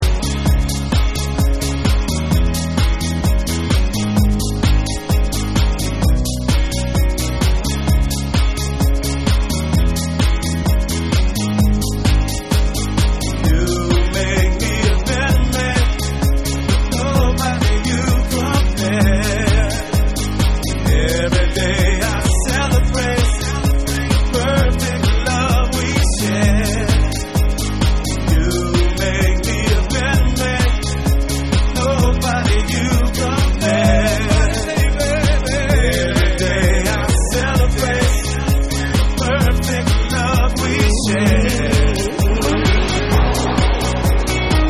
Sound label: Live Guitar Remix